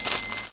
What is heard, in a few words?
Foley